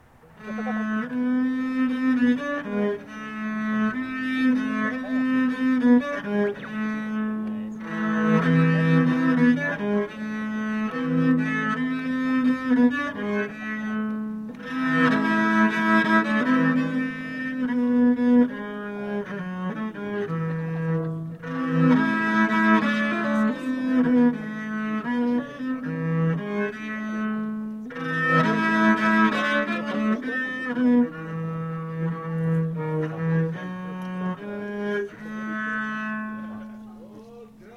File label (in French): Interlude viole de gambe